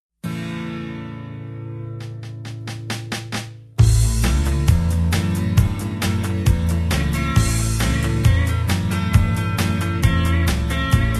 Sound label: hillbilly rock harmony vocal, square dance